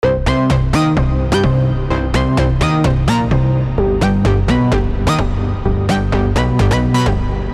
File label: Synth Lead